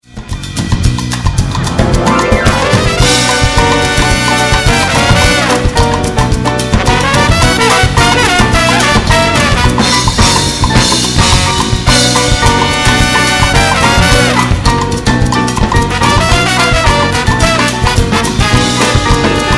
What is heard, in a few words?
Horns